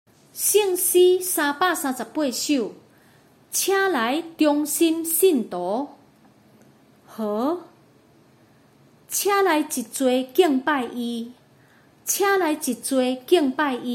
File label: chorus, hymns for christmas; o come all ye faithful